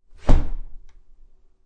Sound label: Front door is shut; Miscellaneous Doors